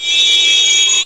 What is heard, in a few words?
beam; HyperAid Demo